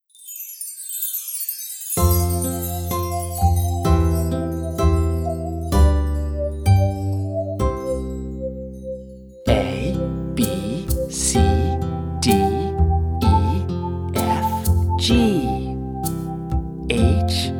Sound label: the alphabet song learn it